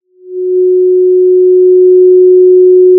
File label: Sound of a cruise ship horn